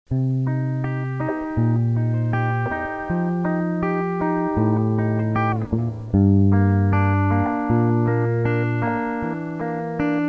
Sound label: riff, egitarre